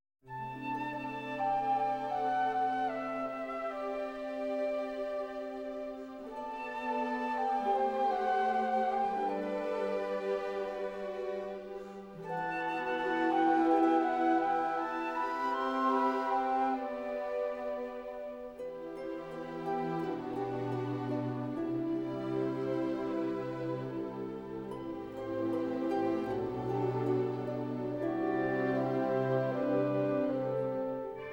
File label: Instrumental